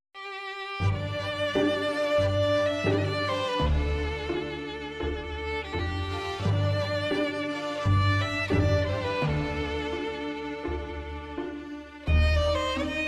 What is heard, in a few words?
Tamborine